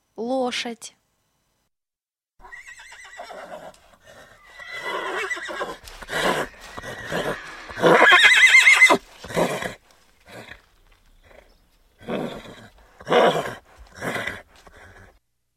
Лошадь ржание

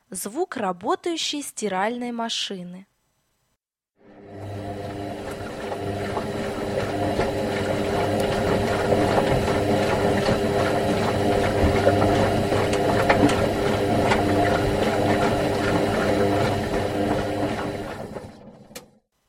Звук работающей стиральной машины

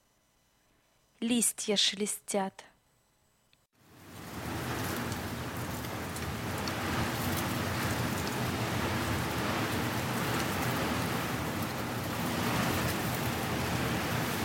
Листья шелестят

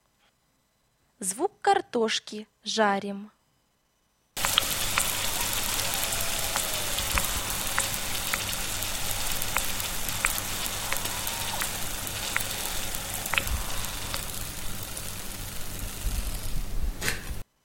Звук картошки жарим

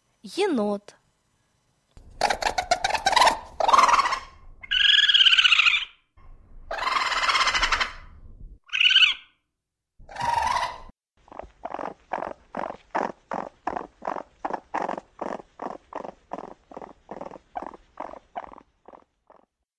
Енот

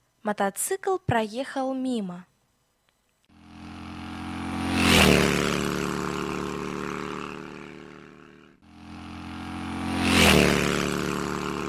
Мотоцикл проехал мимо

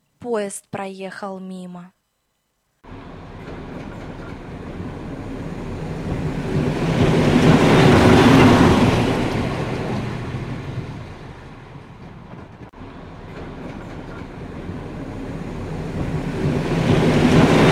Поезд проехал мимо

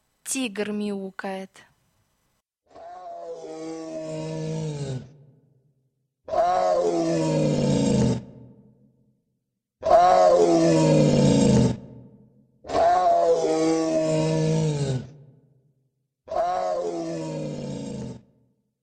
Тигр мяукает

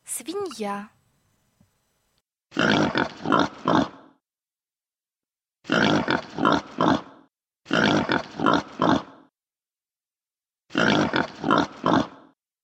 Свинья хрюкает

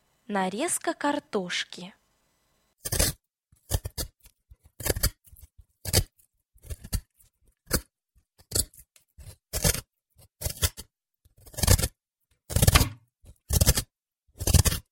Нарезка картошки

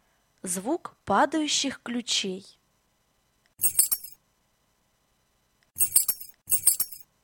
Звук падающих ключей

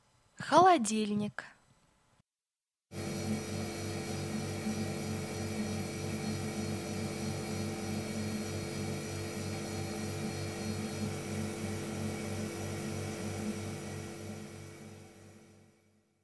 Холодильник

